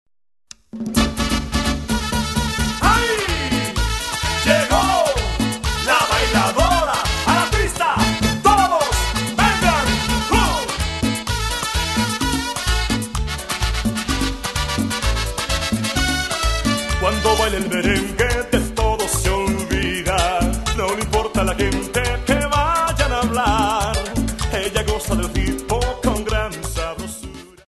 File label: merengue